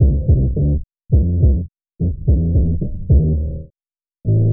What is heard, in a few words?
bass fat cats, Loops, Phonky